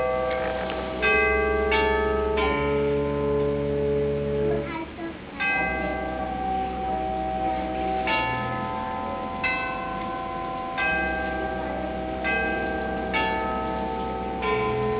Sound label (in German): Glockenspiel; Kufstein